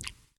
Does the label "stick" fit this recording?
Yes